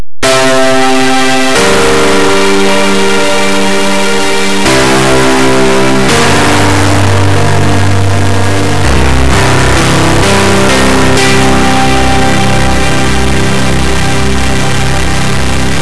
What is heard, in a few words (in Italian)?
musica newage